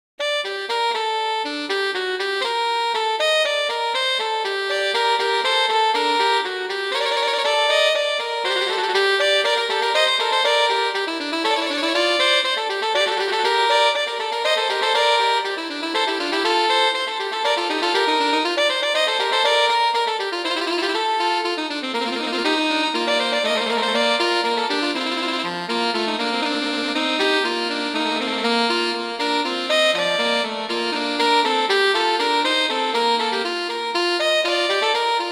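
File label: Vivace moderato, violin viola; Telemann; Canonic; Sonata 4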